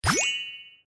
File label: pick up power tile; tekton